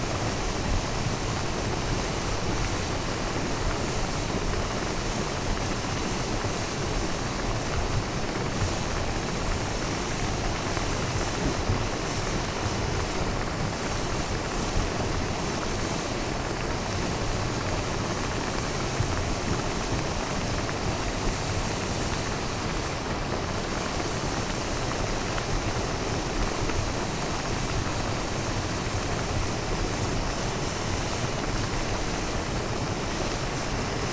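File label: Water Bubble Water Boil; water sounds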